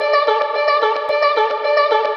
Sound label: Vocal Melody 110 D